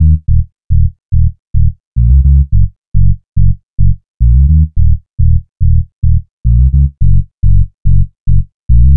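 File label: West Coast HipHop Synthbass Ebmin, Hip Hop Bass loop; Digidesign; Pro Tools Essential Loops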